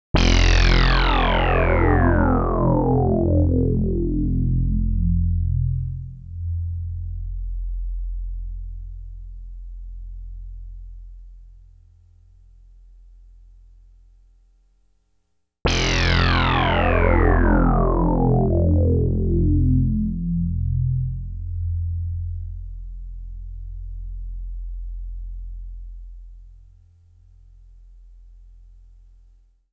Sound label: Sweep